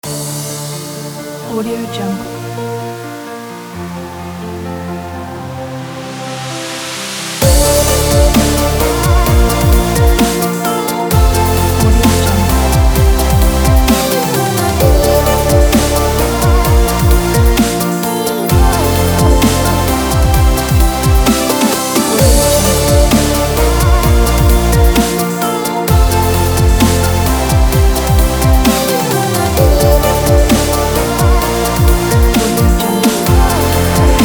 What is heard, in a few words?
melodic inspirational dubstep